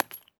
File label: casing bounce soft